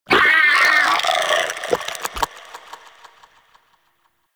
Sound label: Vocal Death, Shrimp Lantern, Creatures; Baliq